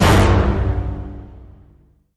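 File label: Hit Orchestra Tutti G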